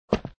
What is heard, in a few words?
down Sound; jumping rope